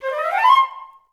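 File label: flute run up C4; Best Service; Peter Siedlaczek's Advanced Orchestra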